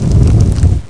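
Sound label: cannon; IO CD 1999 April; DARKMOON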